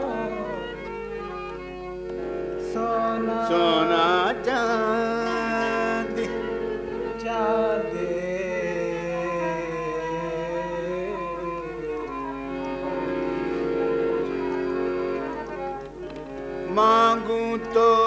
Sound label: Thumri - Pahari